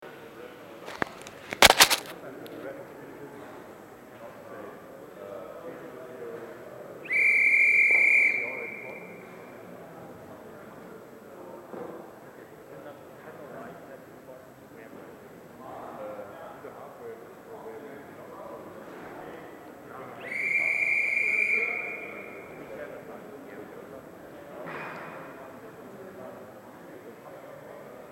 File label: whistles; German Open